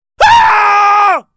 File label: scream; teamcity